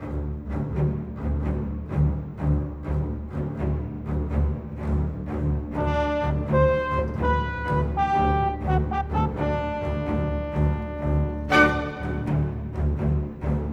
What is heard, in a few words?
Cinematic, Orchestral Series; Sonic Foundry (Sony Creative Software); Orchestral Series VOL-3 - Cinematic